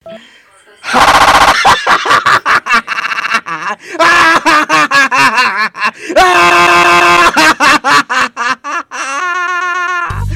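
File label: Smeh, laughter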